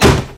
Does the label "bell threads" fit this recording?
No